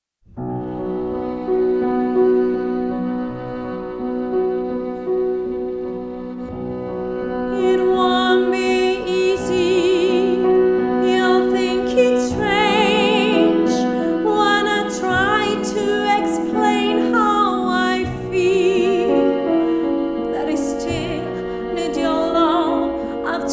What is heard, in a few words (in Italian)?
soprano